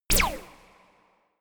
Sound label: space laser gun short silencer; soundpool